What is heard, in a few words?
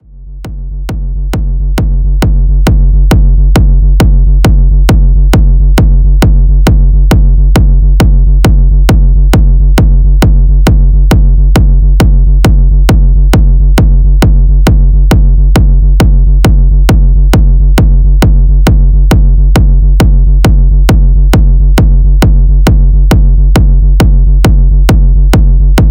signature kicks; zumstein